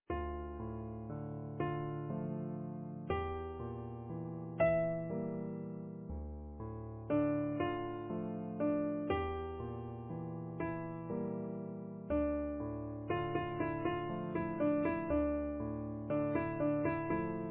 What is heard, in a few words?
Easy Piano